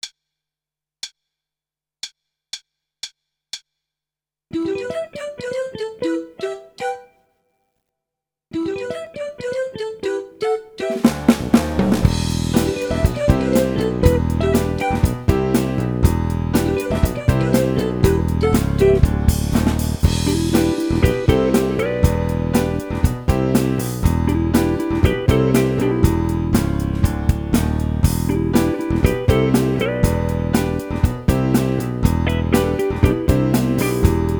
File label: Grooves